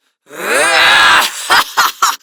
Laugh; Kuva Lich; Persona C; Ability Lift

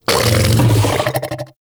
Vocal Burp Attack; Warframe; Hunting Critters; Undazoa

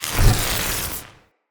Galvanic Zapper Cool Down

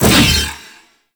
Big Slash Whoosh

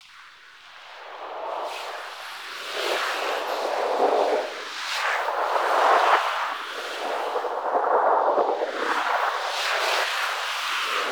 Rail Jack Movement Wind Loop; Warframe; Vehicles

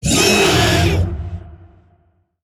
Vocal Pain; Warframe; Zariman; Void Angel

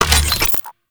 Mech Oil Grenade Ready; Warframe; Nechro Mech; Abilities

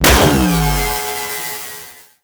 Sentient Symbilyst Laser Beam Fire; Warframe; Weapons

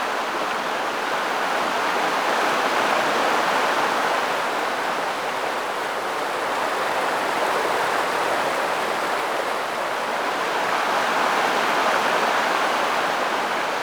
Forest River Huge Distant; Props; Warframe; Grineer Forest

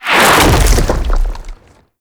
Blink Slam Land; Warframe; Infested Lich

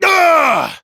Exertion; Warframe; Entrati Lab Quest; 2023 TennoCon; Arthur

